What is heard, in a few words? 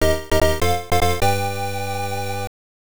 win jingle; Super Medal Fighters; arcade